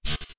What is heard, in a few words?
Electric jab